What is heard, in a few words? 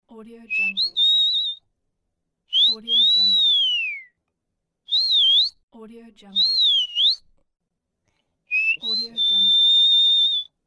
whistling